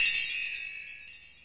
WINDCHIMES

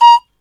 BAMB SH, BAMBOO FLT; Soundscan vol.60; Asian Traditions; AKAI

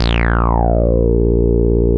A#2, Bass; E-MU Producer Series Vol. 6 – Analogia Project (CD 1); Boom da Bass

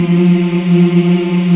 African Mm; Roland LCDP11 Africa VOL-1

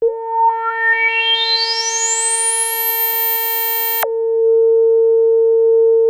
ARP 2600 A4, synthesizer; Hollywood Edge - Giorgio Moroder Rare Synthesizer Collection

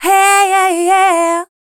female dance vocals

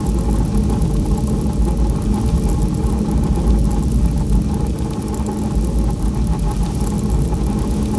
Drone Ambient; Drums & Drones

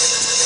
Op HH, Tama RockStar

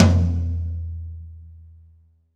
LTom, Akai MPC-500; Q2 Kit

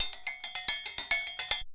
bulu grooves; Best Service; World Colours

